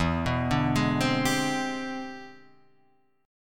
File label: E 7th Flat 13th Sharp 9th E, guitar; arpeggiated